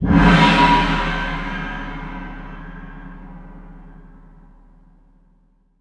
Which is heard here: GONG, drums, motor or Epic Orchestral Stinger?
GONG